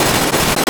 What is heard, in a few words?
Static; Blastwave